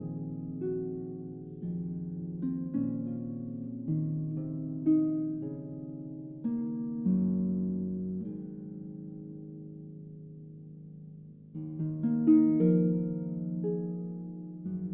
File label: Harp Concerto Lullaby